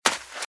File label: Footsteps Sand Dry Run Left; RadwinIsland